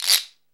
rasp, percussion; Zero-G Ambient 1

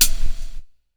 Lo-Fi Confident Hi-Hat; LIFEFORMS

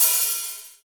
HH hh; Best Service Gigapack 2

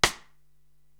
Sidestick Amb, drum machines

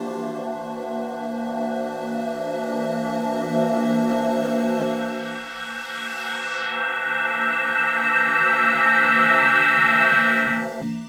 Eerie Pad; KJ Sawka; Drum n Bass & Beyond

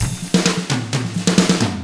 HARD ROCK, Drums

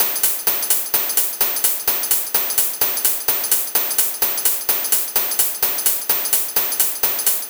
128 bpm hats, combi loops, nu dance electro; Zero-G; Soundclash; Dusseldorfer

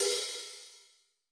brush open; Greytsounds; Scott Peers Sound Engineering

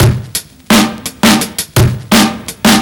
Break 170, Drum&Bass Breaks

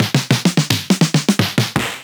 707 mod Snare

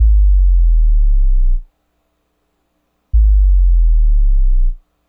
bass, Hardcore Breakbeat, 153 Bmin; Big Fish Audio; Hardcore Breakbeat Action

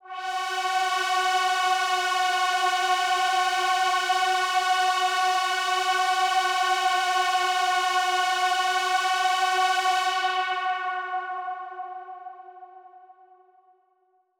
Big Pad F#3; KG MegaSaur

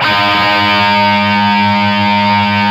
A 4 SOLDAN, Guitars, SOLDANO ROCK; Spectrasonics; Hans Zimmer Guitars VOL-1

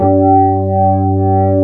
ROCK B3 B, HAMMONDS; East West; Global Sounds

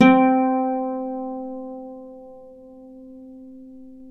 Celtic Harp, Plucked Strings

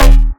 bass hit operators D#; Virtual Riot

